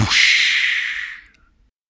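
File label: beatbox Poooish, snare drum; Polyend; Legowelt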